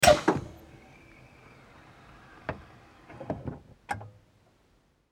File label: Bus Open Door